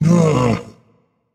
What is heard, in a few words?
nv ugh; smite; voicepack; ganesha